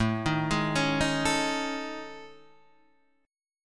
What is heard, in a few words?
A Major 7th Flat 5th, down Guitar DADGAD